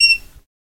Faucet Squeak Metal

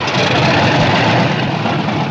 B29 Engine Rev Strt Up, b-29 superfortress; B-29 Engine Rev, For Start Up

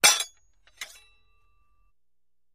Axe Clanks Scrapes Ax

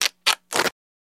Rip Check Out Checkb, books

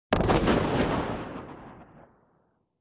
2x16 Oz Black Powder

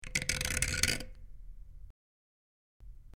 Metal Dial Rusty Metal, switch clicks

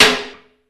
Tub Hammer Hit; hammers

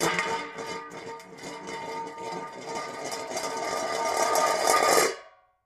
Hubcap Spin On Cement; crashes & creaks

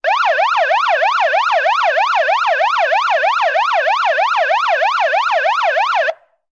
Police and fire engine sirens scream.

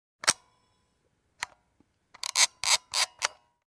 Instamatic Shutter, shutters & movement

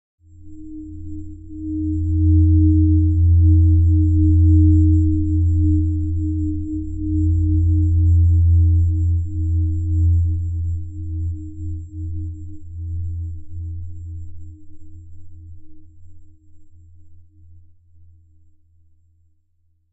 Bed Alien Encounter

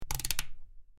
Rotating Switch Clic

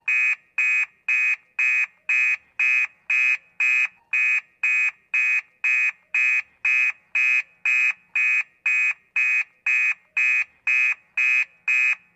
Car Alarm; car alarms

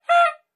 Horns 1, Various Party / Bike Horns, Short.

